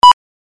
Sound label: ready